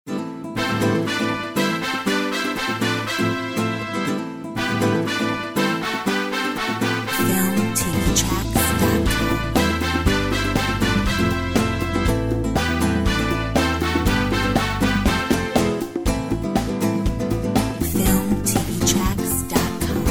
Mexican Rumba; Filmtv-tracks

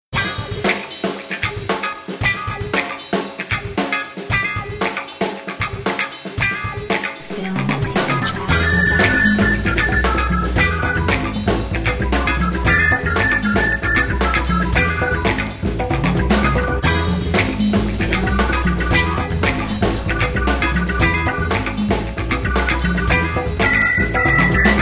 Funky jazz

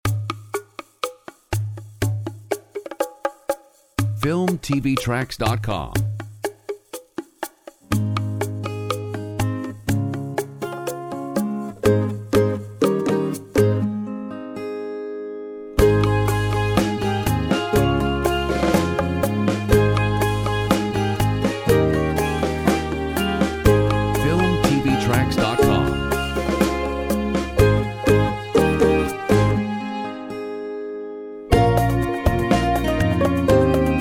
Ukulele Joy; Filmtv-tracks

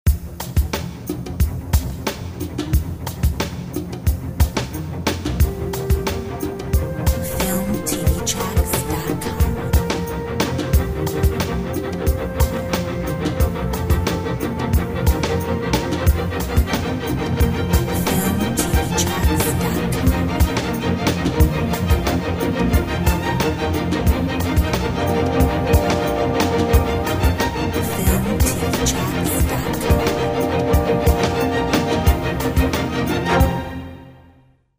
Alert news

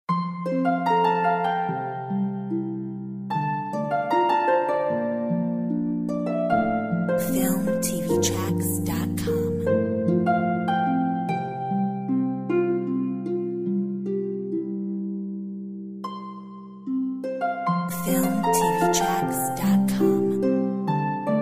Golden light Harp

